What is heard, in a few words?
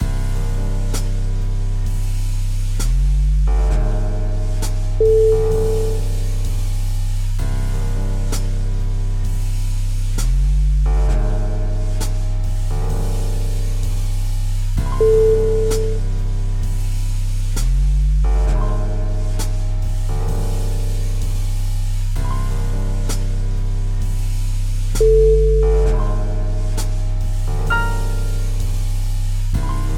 jazz trip hop